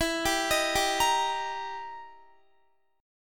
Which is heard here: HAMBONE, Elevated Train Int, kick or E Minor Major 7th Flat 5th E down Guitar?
E Minor Major 7th Flat 5th E down Guitar